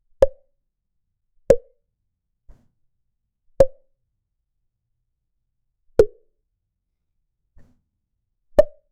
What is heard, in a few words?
comedic bonk sound wood h